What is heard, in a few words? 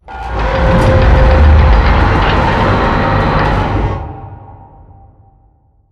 Giant Door Close Move, Warehouse; Prop Warehouse; Titanfall 2; Boomtown